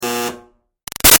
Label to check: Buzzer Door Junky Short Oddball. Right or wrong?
Right